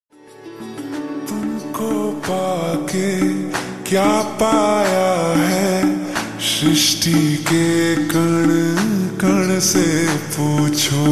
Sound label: Slowed Rewerb Ringtone